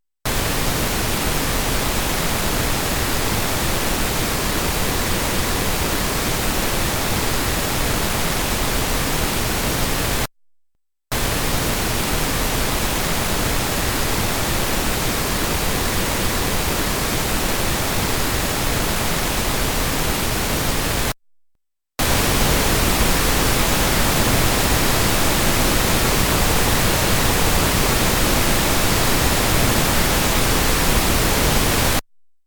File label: Quadrature Summing Filter Noise